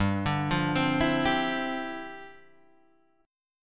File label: G 13th Suspended 4th, G13sus chord; G13sus arpeggiated